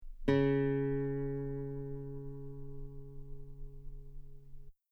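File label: guitar C sharp 3 very long forte normal; bananenkeyboard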